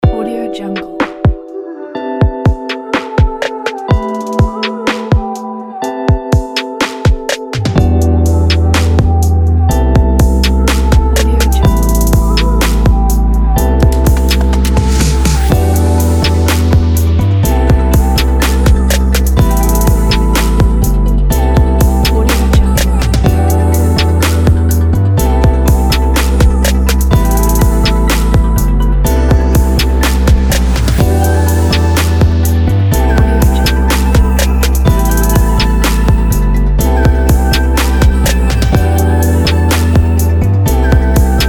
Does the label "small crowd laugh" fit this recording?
No